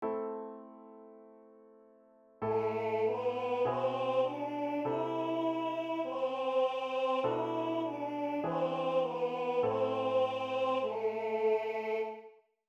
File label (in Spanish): Entonacion hombre